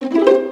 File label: Auto Place Sound; Jolly Battle